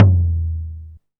Tom, Sequential Circuits Drumtraks; drum machines

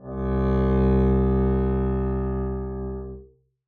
arco c2 f down, double bass

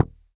noise muted slap, swag bass

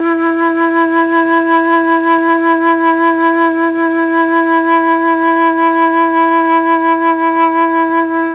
E2 mellotron FLUTE

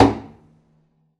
pizz noise body slap, double bass

